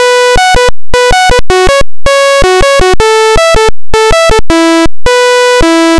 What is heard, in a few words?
dechires bassline court